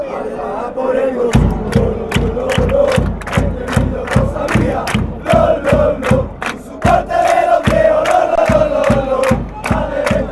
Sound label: fan chants